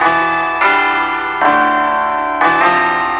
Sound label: PAD